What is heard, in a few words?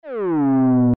VCO Mode D LFO Sweep